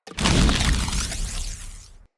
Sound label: Bow Equip; Weapons; Player; Bows; Fey Thorn Bow